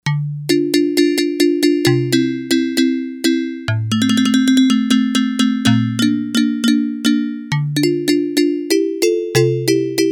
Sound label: Hamarimba, Yamaha DX100; Factory Patches